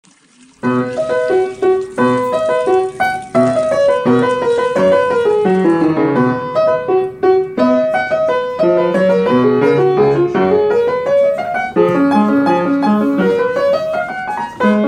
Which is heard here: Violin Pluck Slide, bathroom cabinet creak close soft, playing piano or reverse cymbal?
playing piano